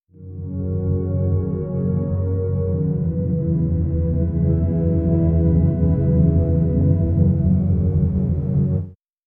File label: music fades to a backgrou; laboratory